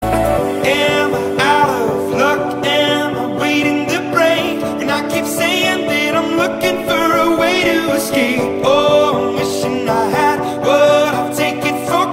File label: remix